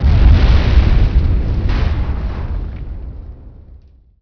big xplosion; Emperor - Battle for Dune

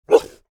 confirm dog; Hearts of Iron IV Death or Dishonor

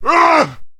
man ugh; Mount&Blade Warband

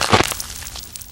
barnacle crunch; npc; Garry's Mod; hl2

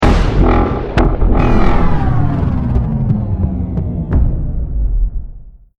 deathcam, music; Counter-Strike Global Offensive

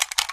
pistol clip out; weapons; magnum; Left 4 Dead 2

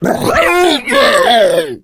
female boomer shoved; voice; Left 4 Dead 2

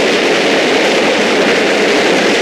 thrown missile loop; tank; attack; Left 4 Dead 2

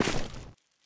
grass, footsteps; Counter-Strike Global Offensive

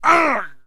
man hit; Mount&Blade Warband

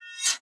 Grave Sword; Sid Meier's Civilization 4 Complete; Beyond the Sword; Units

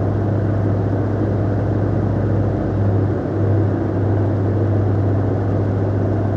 distance airplane medium idle; Hearts of Iron IV Death or Dishonor

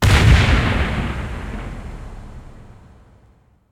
explosion; Alawar; Crusaders Of Space 2

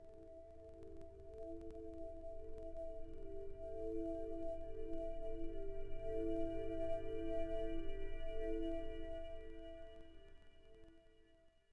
Icy Pad; Ambient; Sid Meier's Civilization 4 Complete; Beyond the Sword

